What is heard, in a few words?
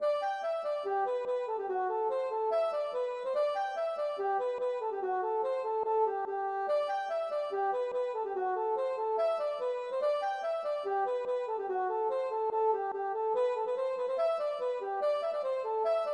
polka